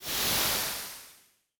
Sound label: Shimmering Movement; soundscrate